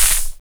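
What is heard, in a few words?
OHH, open hi-hat; X-Static Goldmine 1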